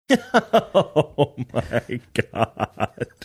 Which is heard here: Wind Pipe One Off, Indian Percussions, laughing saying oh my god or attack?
laughing saying oh my god